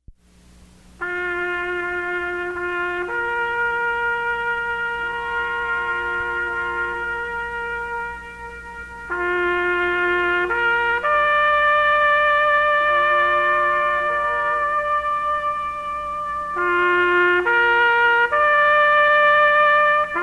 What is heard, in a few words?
taps